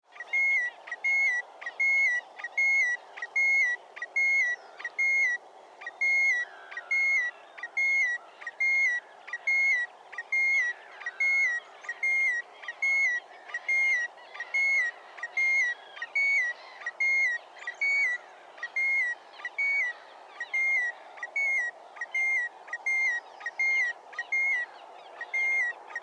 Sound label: Tringa stagnatilis